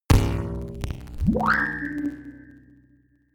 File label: bass bounce distorted, impacts